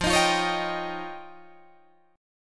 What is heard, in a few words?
F 7th Suspended 2nd Sharp 5th, Guitar, Drop D